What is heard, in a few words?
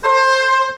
whistle